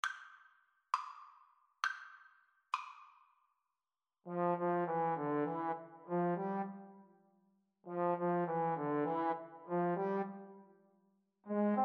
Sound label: Trombone Duet